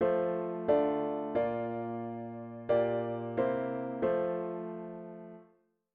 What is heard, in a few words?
chord prog chunks diat inv and V7